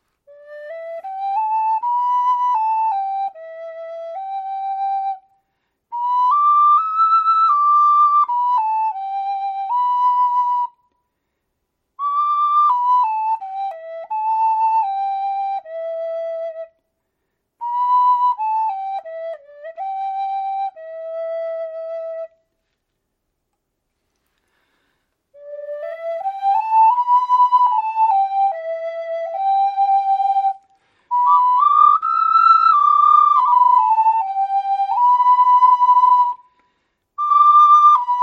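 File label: FLUTE PENTATONIC PEARWOOD QUINTA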